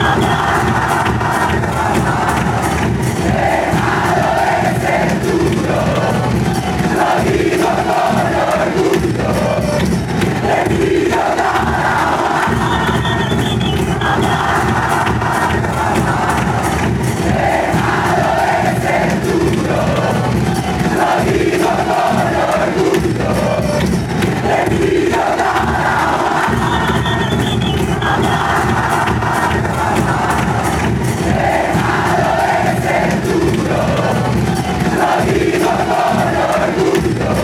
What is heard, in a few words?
millonarios chant; mfc